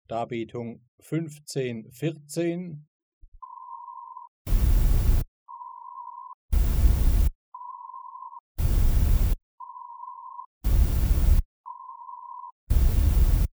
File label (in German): Lautheit, A-Bewertung